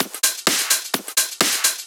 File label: Clubhouse Loop 128 BPM